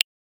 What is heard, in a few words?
Hit; WoWInterface; Plater